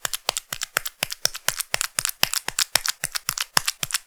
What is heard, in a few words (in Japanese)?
高速パンパン ループ, ピストン運動; 激しめ